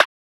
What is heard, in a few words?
Rim